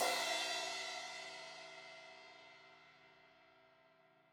Crash 16in edge, Cymbals; Deeply Sampled Drums

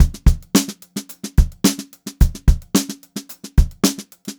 GROOVY BEAT, Funk Groove Drumloops; USB Soundscan vol.38; AKAI

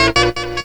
HORN; E-MU Formula 4000 Series Vol. 1; Hip Hop Nation

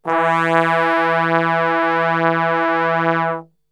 E 1 Brass Super Section; Best Service; TRMBSTRGHT; 90 s Sample CDs

